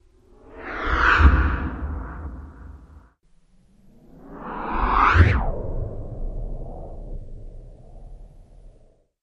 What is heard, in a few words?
Cinematic Transition Whooshes With Hit